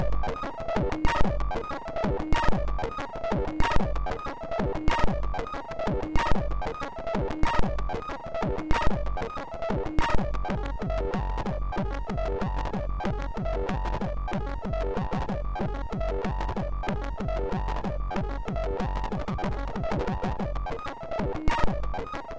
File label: Hard Techno, MOS6581